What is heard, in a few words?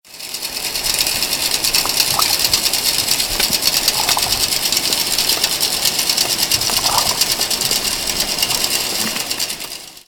ferry; Salish Sea; haro CPA